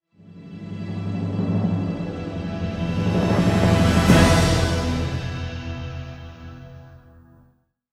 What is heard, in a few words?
orchestra swell accent